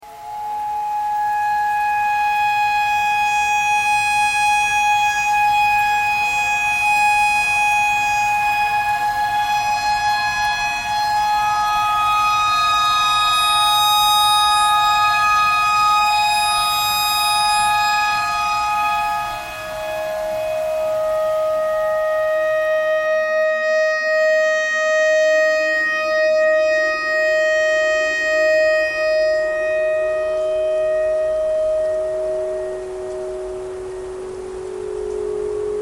clarinet notes e tornado siren thread pentatonic

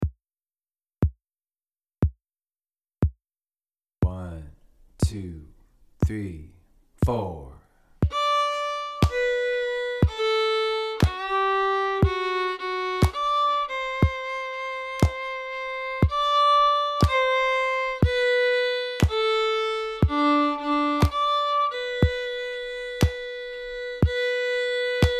Full Mix

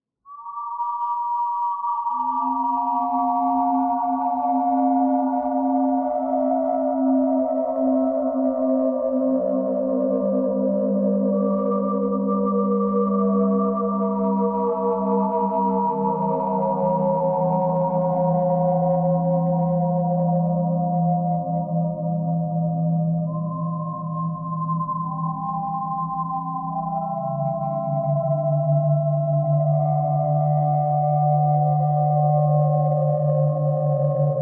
bird theme thread buzz

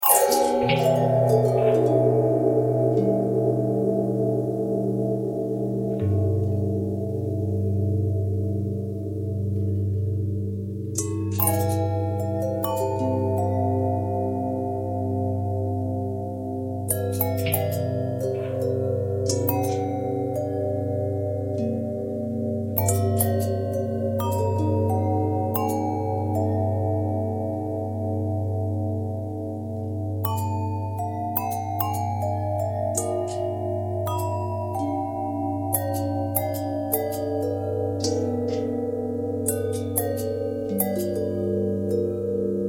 long bell twist, bells

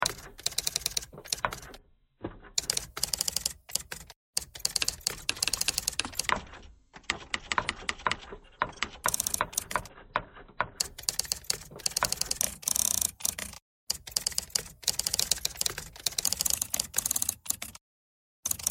typewriter thread harmonic

